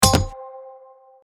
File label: stop, slots; fivem